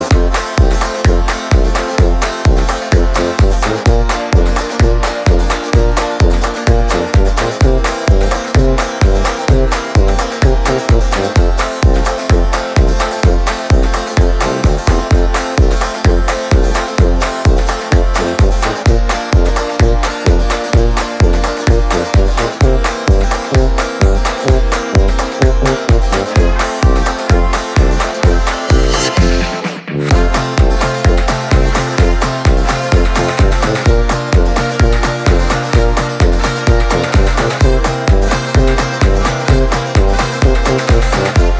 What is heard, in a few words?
Instrumental Version